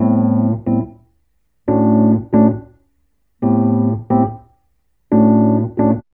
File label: JAZZ; ON THE JAZZ TIP; Sonic Foundry (Sony Creative Software); Drums from the Big Room The Mixes; Demo Projects